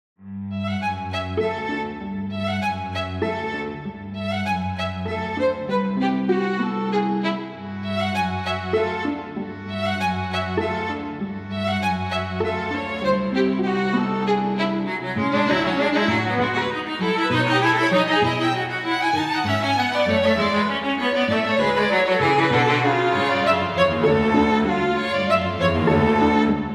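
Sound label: String Quartet vicino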